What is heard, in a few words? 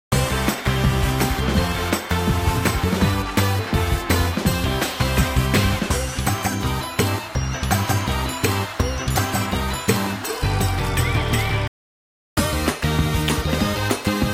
music ring; Boomerang All Stars - Super Goalie